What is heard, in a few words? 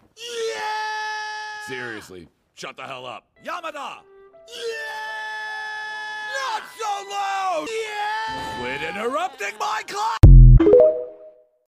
yeahhhhhhhhhhhhhh